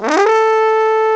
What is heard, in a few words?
HUNT CALL, BRASS; BRASS2